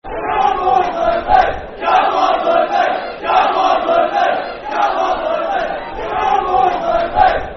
Football Chant (Soccer Song); Come On Livi; Livingston Lfc

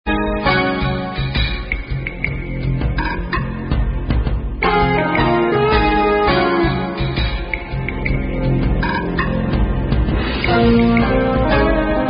Percussive Theme

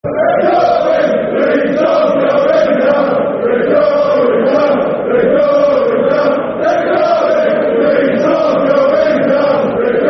Leeds Are Going Down, Football Chants; Derby County Dcfc

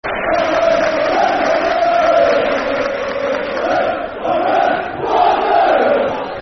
Wanderers, Football Chants (Soccer Songs); Bolton Wanderers Bwfc

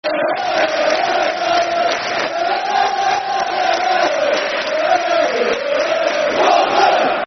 Der Der Der portsmouth, Football Chants; Portsmouth Pfc

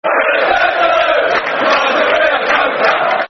Football Chant; Nigel Reo Coker; West Ham WHFC; fanchants

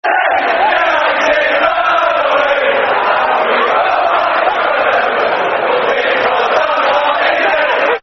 Football Chants (Soccer Songs); Hark Now Hear; Man City Mcfc

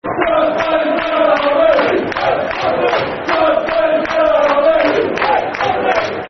Football Chant (Soccer Song), Southampton; George Burley's Red Army; Sfc

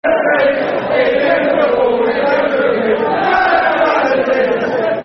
Football Chant (Soccer Song), Southampton; Le Tiss; Sfc

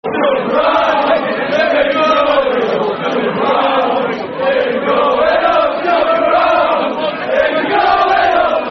[Football Chant (Soccer Songs)]; Jump Around If You're Going Up; Preston North End Pne

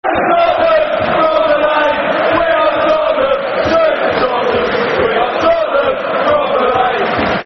Football Chants (Soccer Song); We Are Tottenham V2; Tottenham Hotspur Thfc

